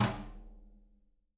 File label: BRUSHES, Living Drums